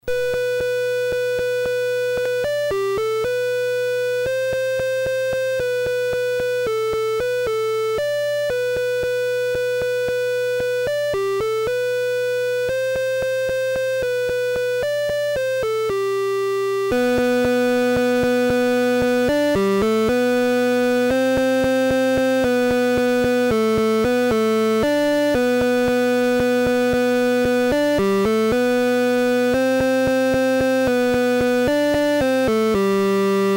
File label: Jingle bells